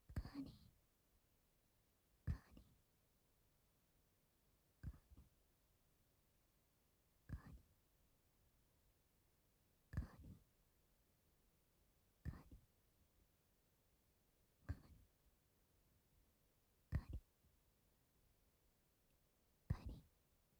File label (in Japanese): 乳首弄りループ 乳首指先ひっかき